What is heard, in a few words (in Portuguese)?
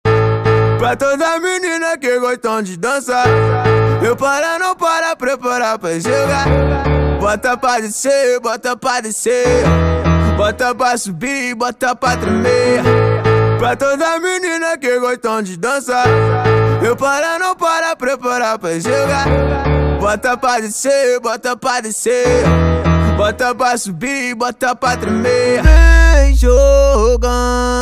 carnaval funk